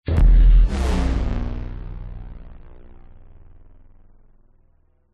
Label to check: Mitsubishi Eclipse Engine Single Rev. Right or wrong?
Wrong